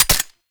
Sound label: Pistol Mech; Warframe; Weapons; Tenno; Lasrian